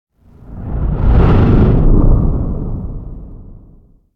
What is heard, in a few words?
Whoosh Heavy Movement Deep And Slow Distorted Whoosh; Hollywood Edge; sound designer's toolkit v2